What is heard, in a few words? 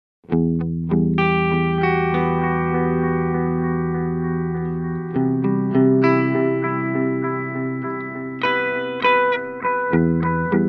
Echo Shifter ES3 analog long Delaytime; Ibanez